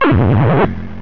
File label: Spit Up; battle anims; PokeRogue